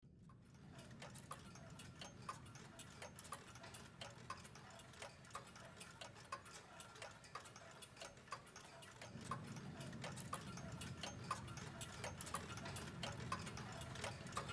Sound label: Time Clocks